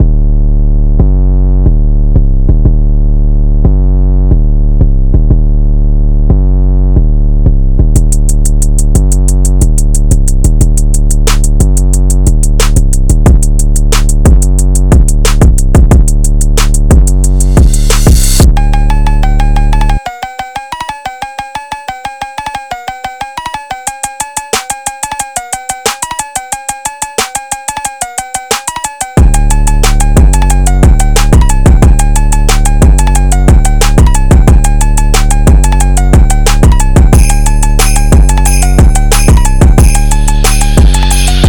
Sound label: Rock Phonk